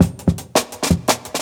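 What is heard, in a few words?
Jungle Breaks, 168 bpm; Original Jungle Breaks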